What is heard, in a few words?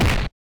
punch; roblox